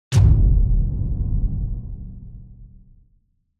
Low Impact

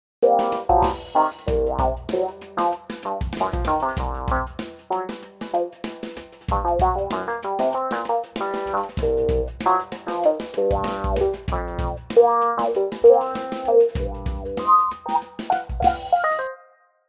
Rhodes 73; Epia; Kontakt; Loot Audio

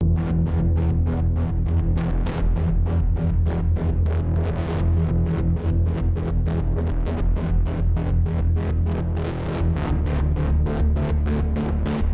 Pulses; SIDEEYED

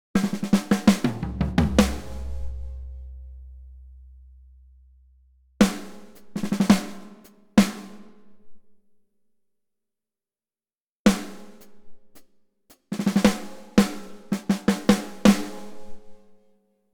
Drums Raw

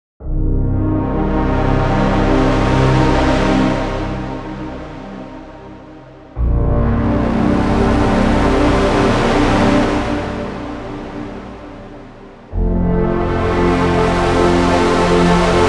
TEXTURE Minimal tonal

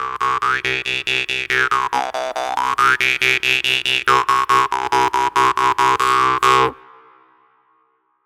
Jaw Harp

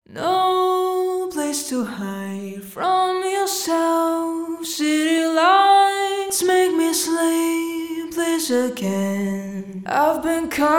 Vocal Echo Chamber; Outside Records

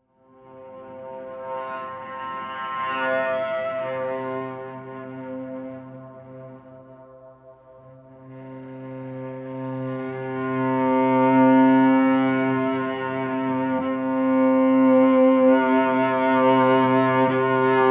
Atmospheric Cello Sustain C-1

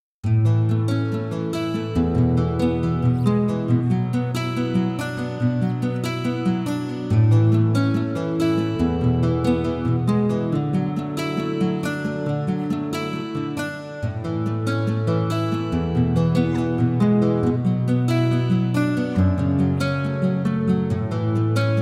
Combination Three nylons; Fingerpicked Guitars; Marcos Ciscar

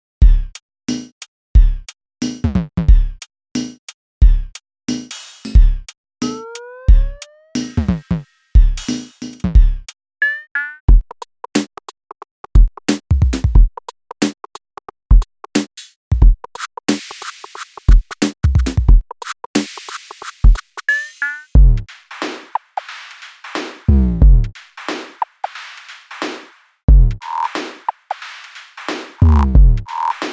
Grit Kit

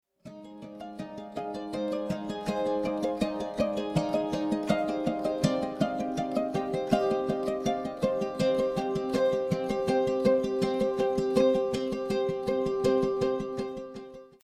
Uke

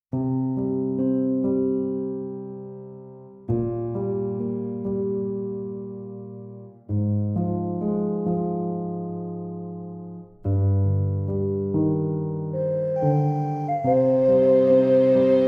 Ocarina Orchestral

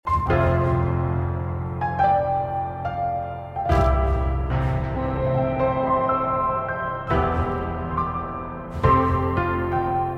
Pianos, Radioactive Pianos from the Chernobyl; PRIPYAT

